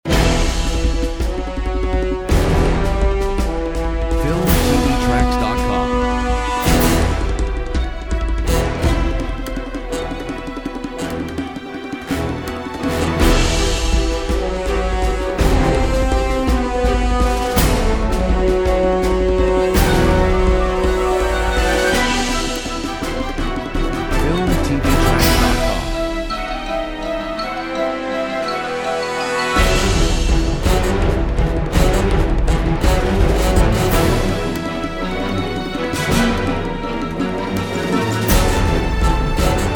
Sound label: mission omega; Filmtv-tracks